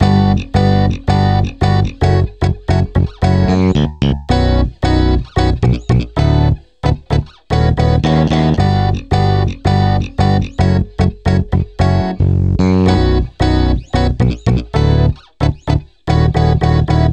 chord loop 112 Bmin

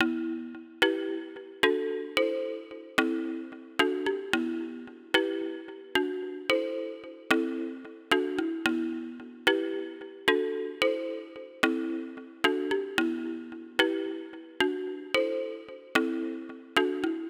111BPM Eminor Mallet

